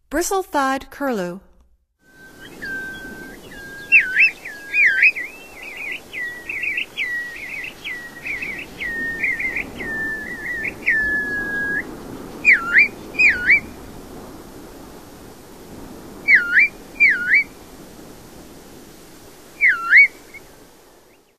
Bristle-thighed Curlew; North America; Stokes

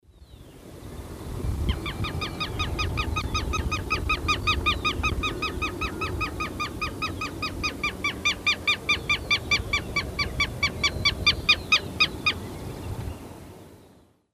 Vanellus chilensis, calls; S. America; Eastern Lowlands

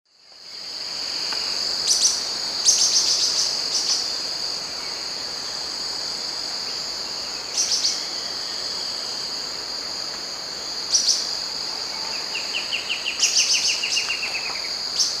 Hylophylax naevius, terra firme, call; S. America; Eastern Lowlands

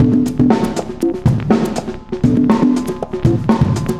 cooling 120 bpm; loops

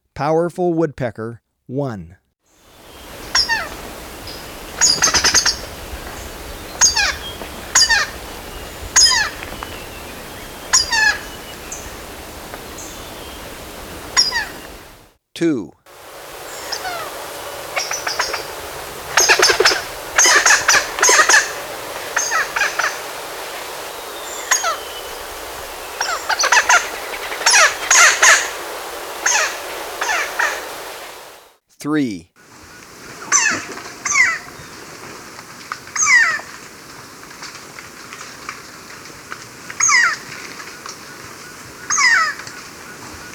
Powerful Woodpecker, Birds of Ecuador; S. America

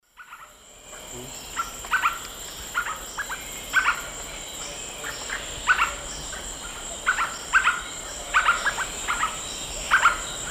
Myrmeciza hyperythra, call; S. America; Eastern Lowlands

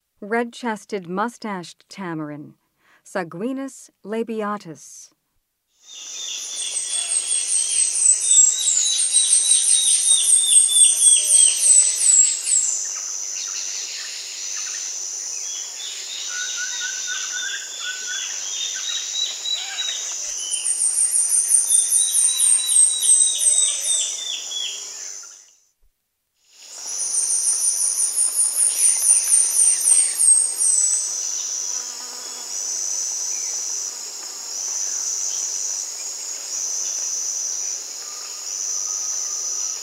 Red-chested mustached tamarin; S. America; Rainforest

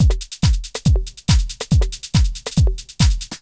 techno 140

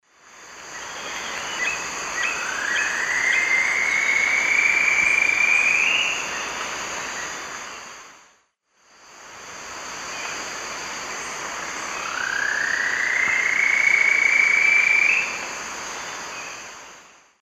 Philydor pyrrhodes, song; S. America; Eastern Lowlands

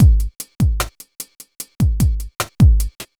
hiphop

